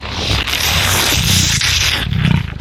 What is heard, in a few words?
vampire sucking; stalker